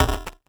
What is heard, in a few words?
One Shot, Hits